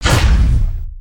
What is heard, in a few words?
Shinobi Roll Start